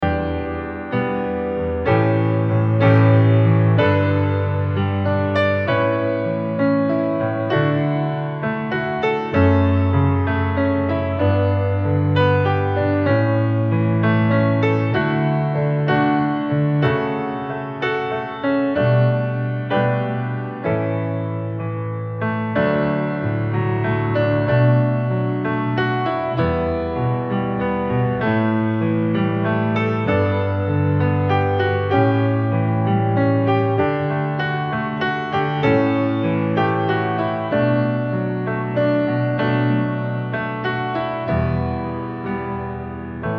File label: solo piano